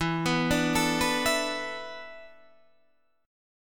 E 7th Suspended 4th, Guitar, Drop D

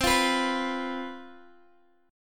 C Major 7th Suspended 4th, down, Guitar, Open G

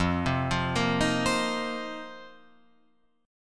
F 7th Suspended 4th F down Guitar Open F; arpeggiated

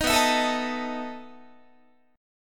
B 11th Guitar; strummed

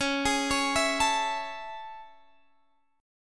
A Major 7th Db

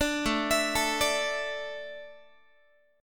A Suspended 4th, Guitar; Asus4; arpeggiated

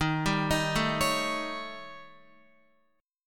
Eb 7th Flat 5th, Eb, Guitar; x,6,7,8,9,9; Eb7b5 arpeggiated

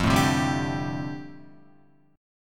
F 6th Add 9th, Guitar, F6add9 chord

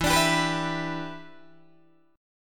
E Minor 7th, down, Guitar, DADGAD; Em7; strummed

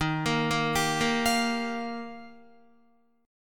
Eb Major Add 9th, Guitar, Ebadd9 chord; Ebadd9 arpeggiated; 10,13,10,12,10,13; 4F,4A,5C,5G,5C,6E; JGuitar

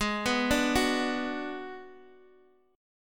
G sharp Minor 7th Flat 5th, Guitar, Open G; x,x,6,4,3,4

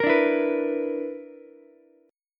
B-Minor Major 7th Double Flat 5th B down Ukulele; BmM7bb5 strummed; JGuitar

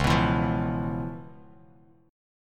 C sharp 7th Sharp 9th C sharp Bass Standard; strummed; C#7#9; JGuitar

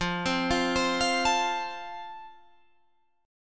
F Major, Guitar Drop D

